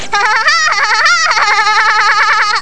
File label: hahaha